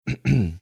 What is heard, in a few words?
clearing throat